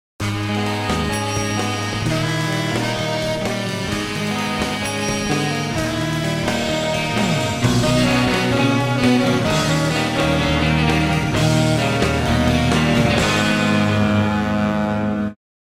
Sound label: Cute Finger Cymbals